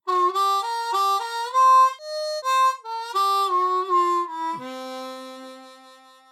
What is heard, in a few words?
Low end on C Harp adding 4OB the Eb Flat 3rd; 1st Position Blues Harp; 1st Position Blues Harp – An Introduction (Part 4)